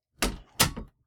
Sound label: door; ドアを閉める音